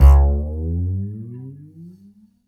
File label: Upright Acoustic Bass, 1ST POSITION; Larry Seyer